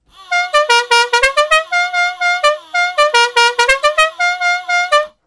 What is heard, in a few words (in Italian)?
Tromba pneumatica musicale; Generale Lee Hazzard